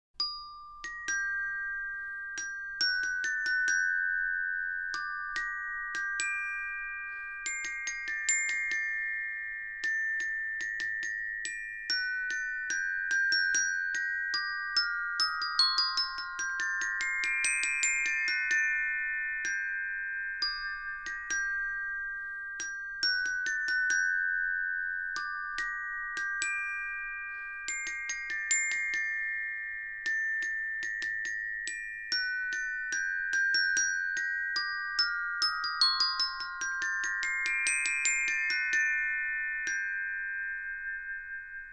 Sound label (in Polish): Dzwonki; Przedszkole nr 1 Tuchola